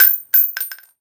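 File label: shell bounce small, weapons, shells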